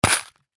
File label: Nuts Washers Package Open Rattle Pop; Plastic Box; Hardware; INDUSTRY; Blastwave